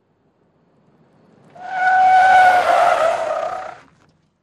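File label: SUV Truck 180 Skid Mix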